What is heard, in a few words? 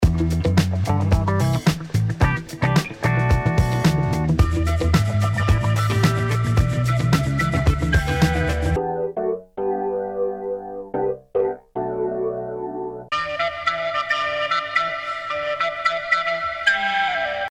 Beat Shave